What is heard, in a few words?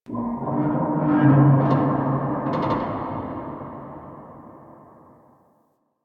Stadium Metal Creaks Far; Warframe; Ambience; Nineteen Ninety Nine